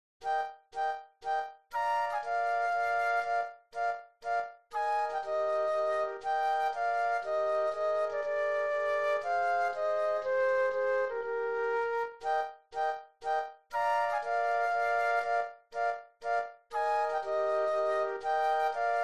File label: flute trio; classical